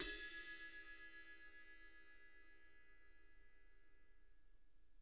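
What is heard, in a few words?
RIDE BELL; Sonic Reality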